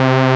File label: STRINGS, ANA SYN; AKAI S6000 CD-ROM - Volume 1; SAMPLE WAVE MIXING 2